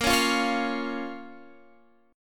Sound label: A sharp Minor A sharp, Guitar, Standard; strummed; JGuitar